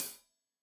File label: Hat Tight, Hi-Hat; Deeply Sampled Drums